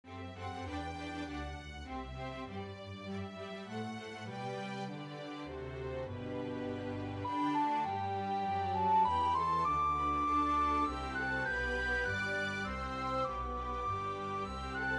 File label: Recorder Track with Melody; The First Nowell